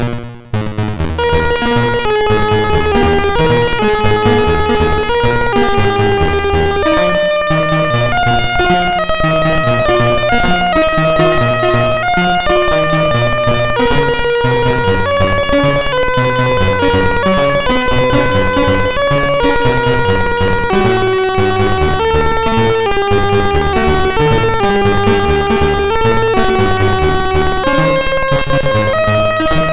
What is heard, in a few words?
Randomly generated music BASIC, sid MOS6581R2